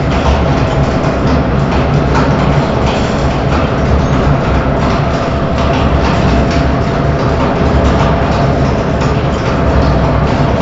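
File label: ambience industrial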